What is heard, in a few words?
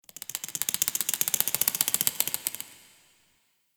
Interior Spider Crawling; Warframe; Duviri Murmur; Ambience